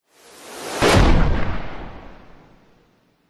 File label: science fiction gun power up then shoot; zapsplat